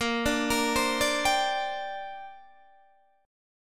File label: A sharp 6th Add 9th, Guitar Open D